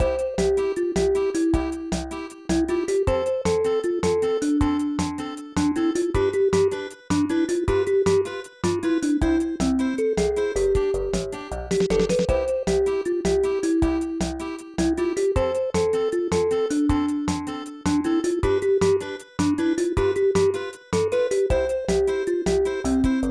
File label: Conversation; Doraemon 2 Nobita no Toys Land Daibouken; SNES